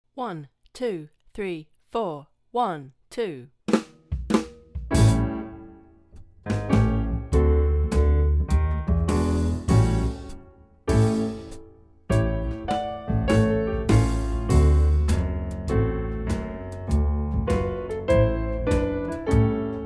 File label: Sliding semitone blues backing